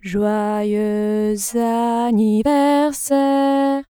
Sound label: female anniversaire isochrone transformed, The resulting file has random duration, but these changes of pace are aligned with note boundaries.; CLEESE; PhaseVocoder